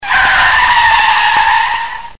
brake; Formula Drag

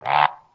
monster quack; DuckShoot

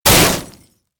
bump; Formula Racing

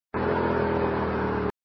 motor slow; Grave Drive

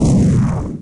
explosion; EarthAttack

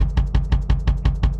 helicopter fast; Flapcat Copters

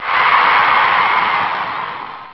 cheers attack; BattleBattle

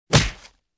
hit; Green Chick Jump

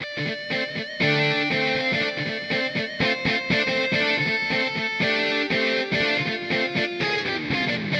menu guitar; DeadlyRace

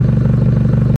engine; DeadlyRace

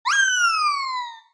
duck down; DuckShooter

